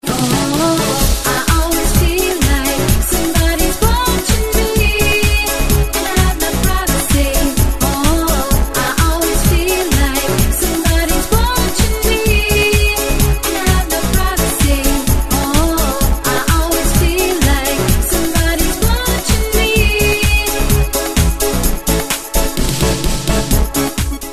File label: chorus part, ringtones; Arphiola ringtones; Soundalikes and covers